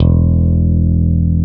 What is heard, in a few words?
BASSES, Roland